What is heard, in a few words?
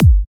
soft hit normal